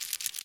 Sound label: rodent scratch short; ambient; animal; Source Engine; cstrike